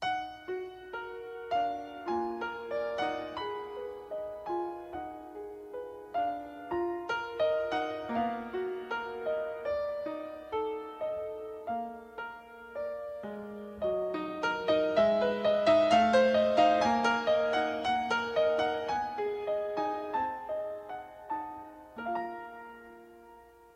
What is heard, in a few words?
piano, Sad